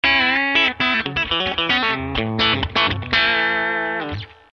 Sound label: Clean Tele; compressor pedal; Tele Thinline; Tangerine Squeeze